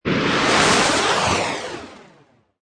Magical Projectile Whoosh, Magic sound of casting spell, conjuring or wizardry